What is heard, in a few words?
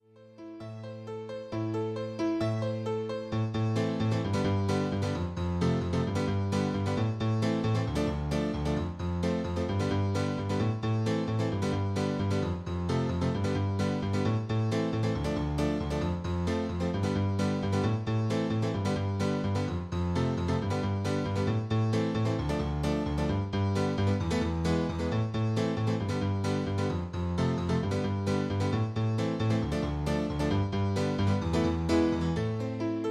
piano